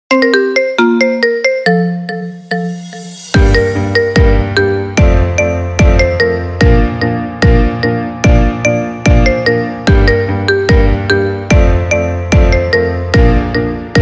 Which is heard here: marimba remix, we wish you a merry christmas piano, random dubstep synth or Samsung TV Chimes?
marimba remix